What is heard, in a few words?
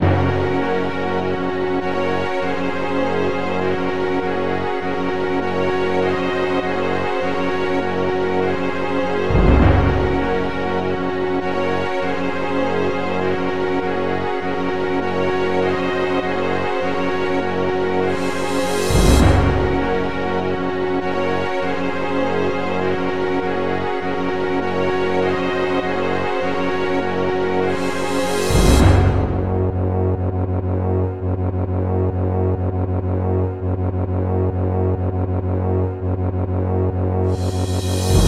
Fortress Slow; sonic epoch